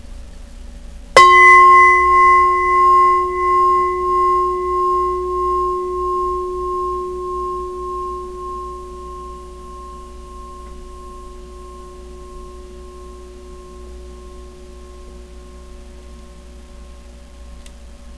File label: japanese temple gong